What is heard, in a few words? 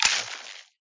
pistol Click; Greypistol